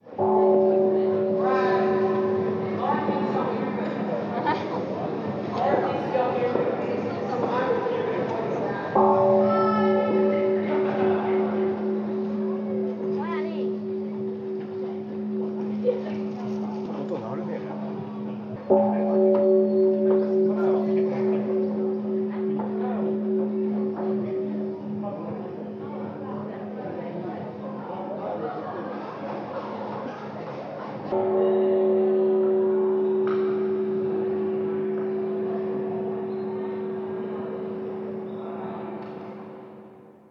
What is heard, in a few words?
Kyoto Temple Gong